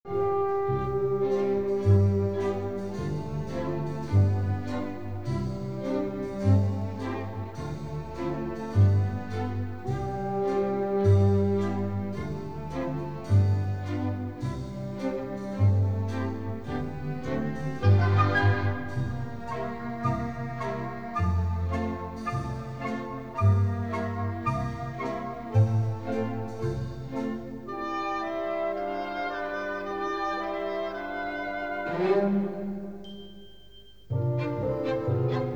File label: ALTO track only